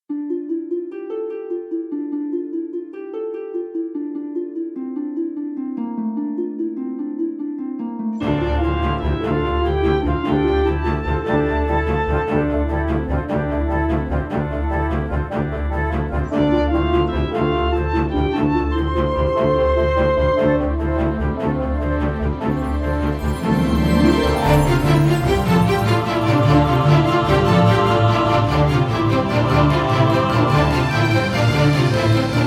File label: Orchestra